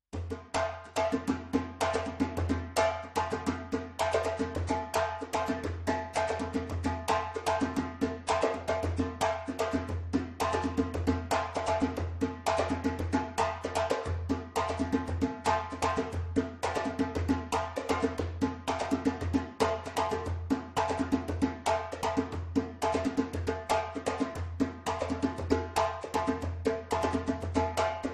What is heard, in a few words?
timbal